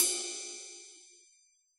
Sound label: hip hop ride